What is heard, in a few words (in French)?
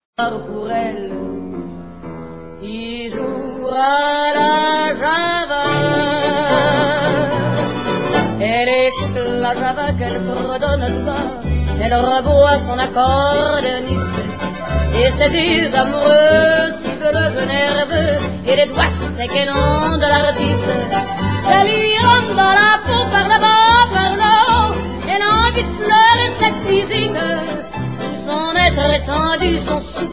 accordeon